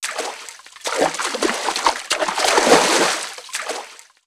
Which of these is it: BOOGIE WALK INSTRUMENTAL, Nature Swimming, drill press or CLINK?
Nature Swimming